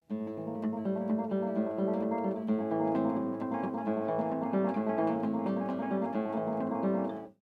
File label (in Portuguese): Harmónicos